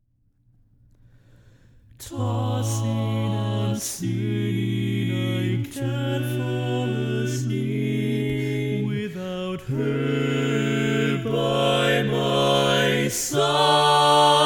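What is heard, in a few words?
Baritone, barbershop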